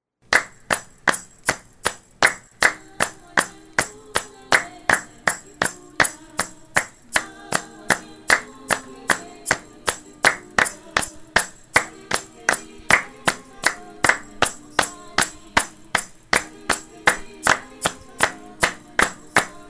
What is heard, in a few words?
Backing track